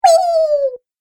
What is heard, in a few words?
Cartoon Game Voice Weeeee